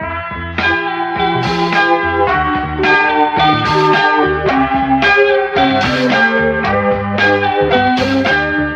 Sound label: horns, phonky loops